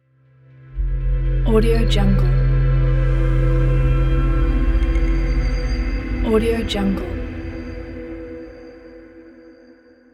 fresh tech logo music

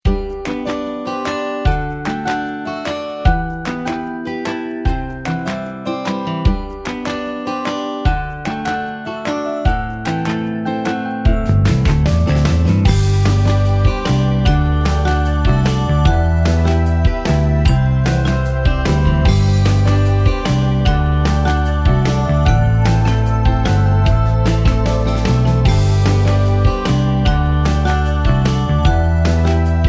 acoustic inspiration music

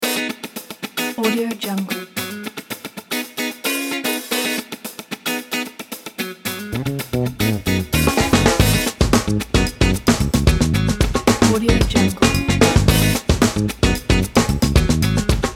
positive funk music

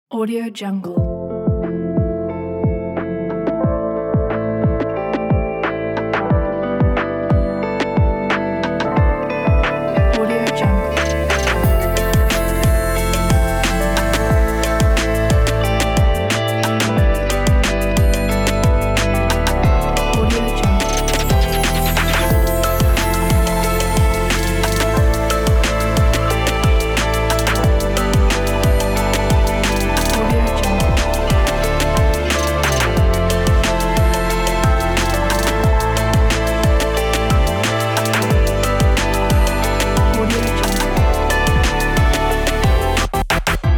emotional cinematic hip hop music

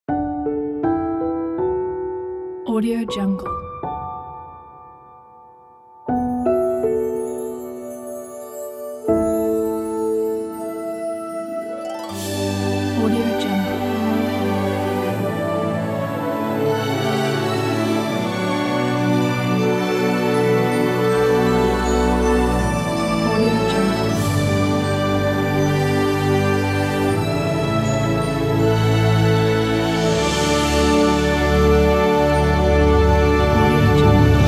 tv advertising love theme music

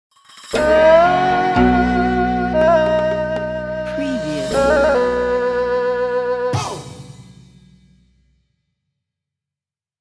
china logo music

